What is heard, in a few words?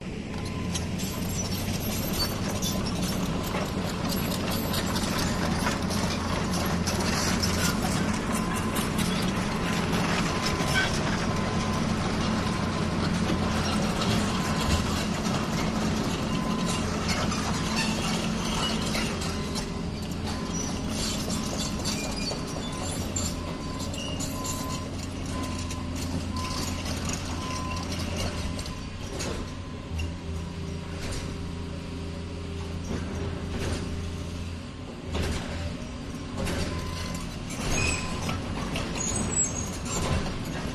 excavators contruction site idles and drives; your free sounds